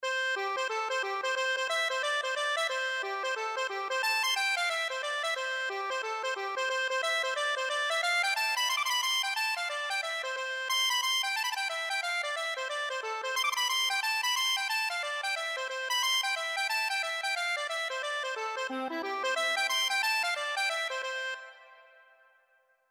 accordion

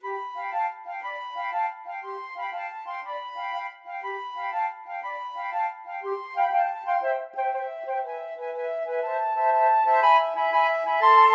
flute trio

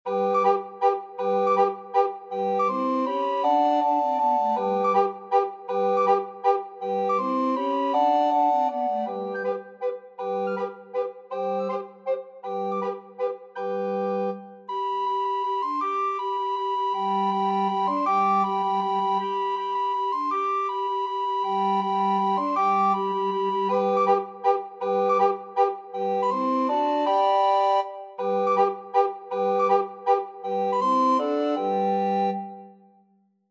recorder quartet

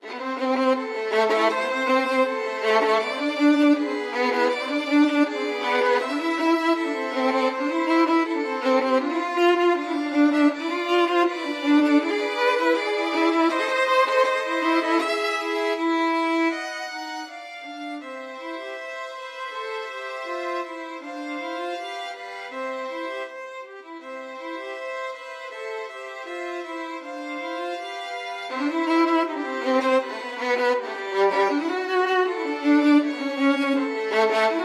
violin trio; confutatis

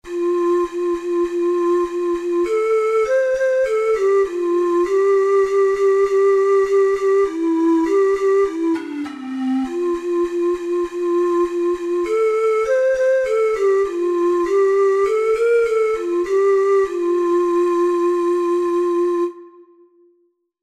10 little indians, ocarina four hole

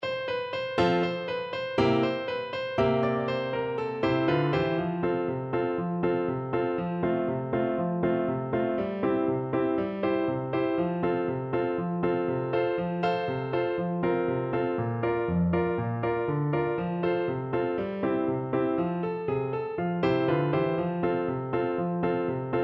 i met a bear, French Horn

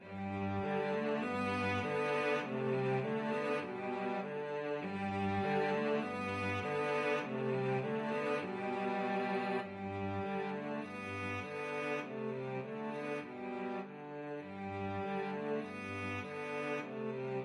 cello duet

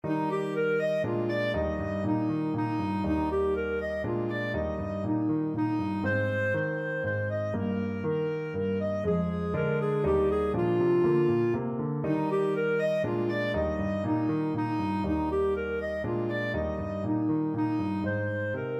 minuet a, clarinet; bach

